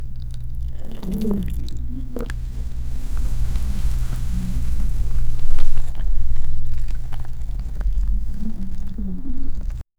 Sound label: very soft natural sound, flora